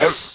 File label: jump; vortex; slith